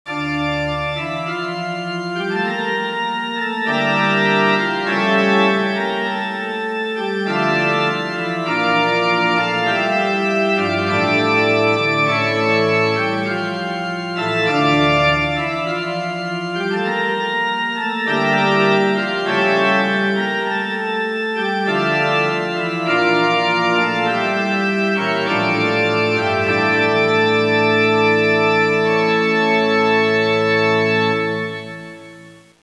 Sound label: Organ